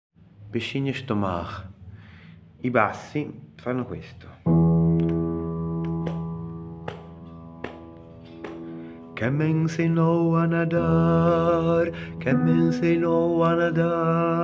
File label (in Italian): BASSI